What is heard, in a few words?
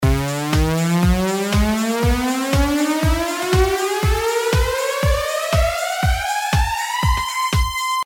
synth recipe